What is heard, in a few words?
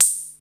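hi-hat open; 300 Drum Machines; Yamaha Motif ES-Series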